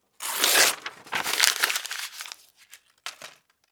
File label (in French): papier dechire froisse